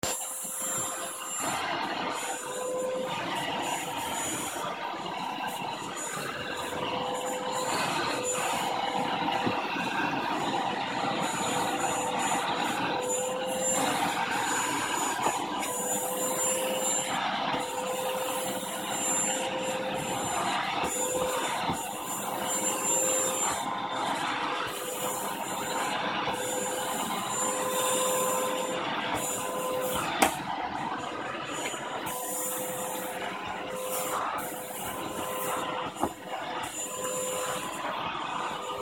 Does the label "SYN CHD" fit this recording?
No